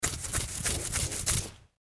scratching head

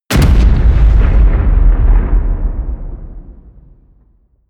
air strike

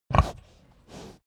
pig grunt breath

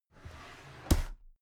drawer cabinet carton open regular

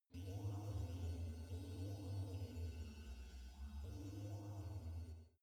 space alien fabric machine butting huge far

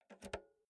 insert jack multi socket

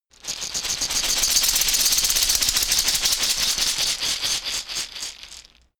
shaker wood rough rattle dynamic

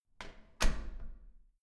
door close soft

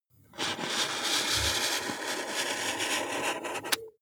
marker mark soft long

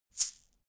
shaker plastic egg hit single hard

